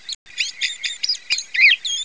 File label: warbling verio